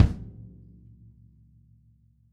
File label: kick